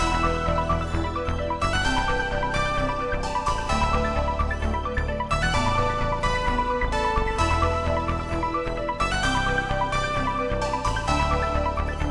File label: Victory Loop; Sockventure